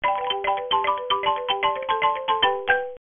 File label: Boot; bestri pitapat puzzle heuk sun baek sa; f2 system arcade gamerip